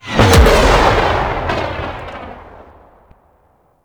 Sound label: Drop Ship Warp In Boom; Warframe; Vehicles; Grineer Drop Ship